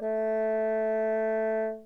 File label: rock type